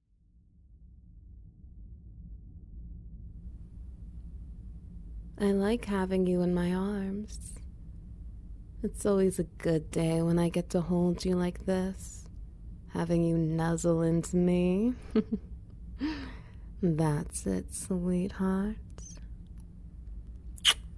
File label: Ambience Wet Noises; Cyborg Cuddlefuck